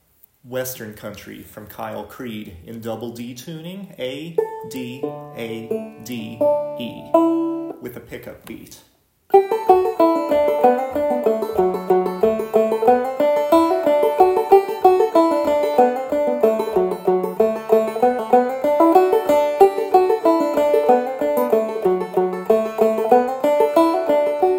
Western Country

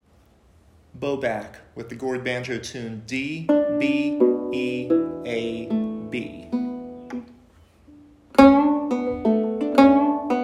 GOURD; Bowback

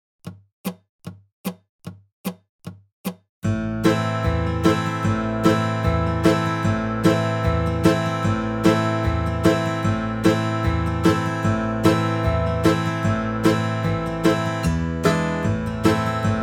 75 BPM

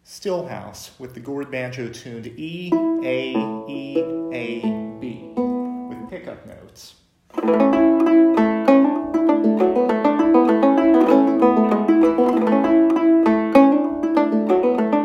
Stillhouse GOURD

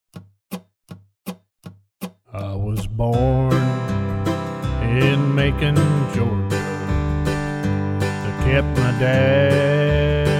Key of C vocal and backing track

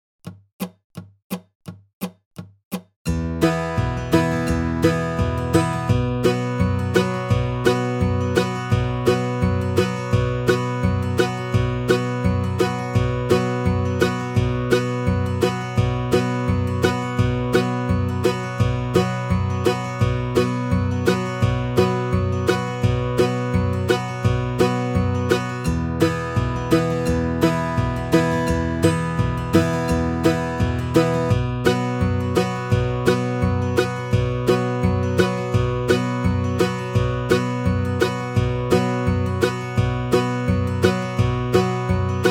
Bm - 85 BPM; Bright Sunny South

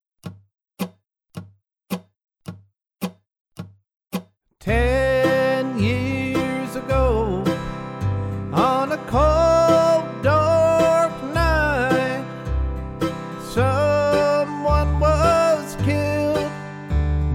Key of C vocal and backing track

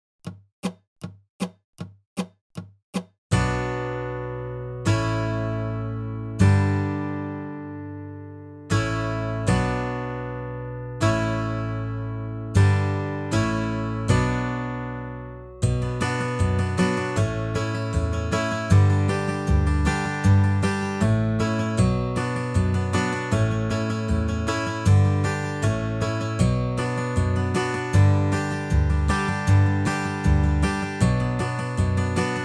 78 BPM

